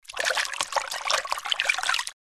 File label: Item movements in plastic container filled with water